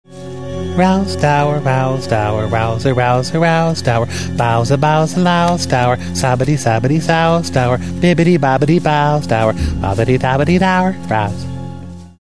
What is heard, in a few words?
song; MST3K; Final Sacrifice; rosdower